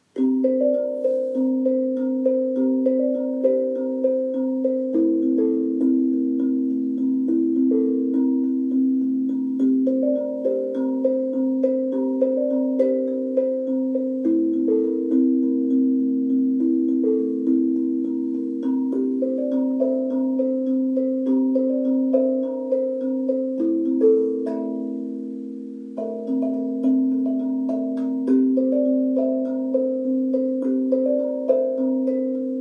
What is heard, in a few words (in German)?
Zenko Penta C Impro Schlägel